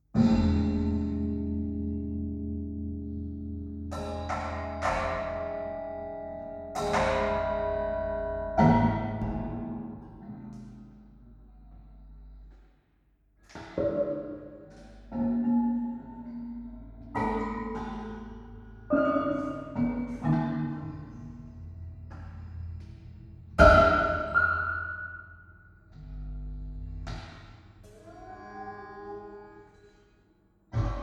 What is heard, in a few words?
eguit